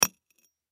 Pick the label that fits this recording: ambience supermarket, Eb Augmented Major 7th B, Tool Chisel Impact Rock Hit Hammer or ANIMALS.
Tool Chisel Impact Rock Hit Hammer